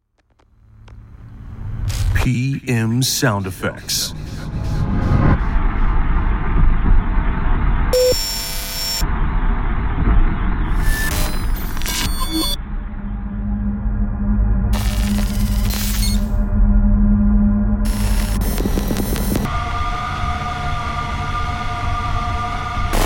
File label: Obscure Frequencies; PMSFX